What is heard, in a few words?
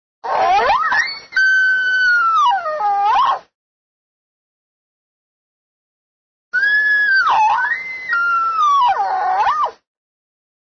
bull, elk; elk 2001